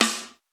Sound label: snare, snare house